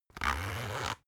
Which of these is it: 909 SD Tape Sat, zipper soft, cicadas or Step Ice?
zipper soft